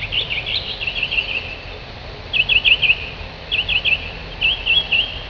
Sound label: mock, mockingbirds